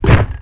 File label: dog footstep walk; hl2 ep1; npc, dog